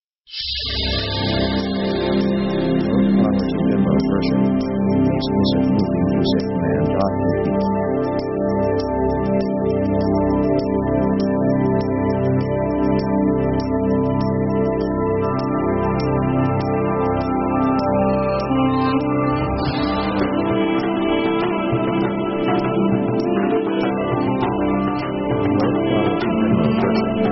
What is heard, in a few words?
atmo blue sky